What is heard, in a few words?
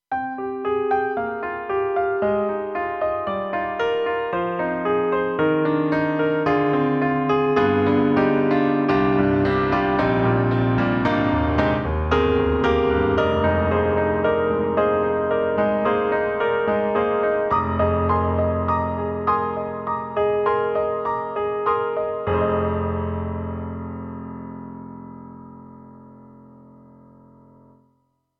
KORG M3 XPanded Combination Piano and Strings